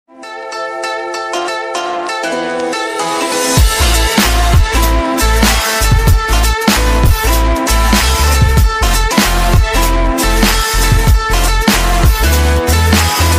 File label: Corporate Japan, ringtones